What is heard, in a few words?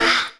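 Old Fox Damage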